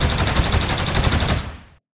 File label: sci fi machine gun